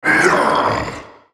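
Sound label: ultimate effort, en US; Draven SoulReaper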